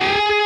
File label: bend full Left-hand vibrato